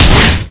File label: Damage; Tager